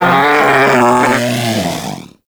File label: Predator Vocal Death; Warframe; Hunting Critters; Characters